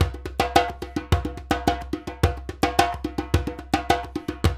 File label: LOOP L, Percussion; InVision Interactive; Percussion Slam; PROGFROG